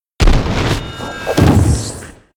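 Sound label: mushroom spawn, monster; Senillinea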